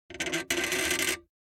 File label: Door - Wood Creak Shyly; Hollywood Edge; sound designer's toolkit v2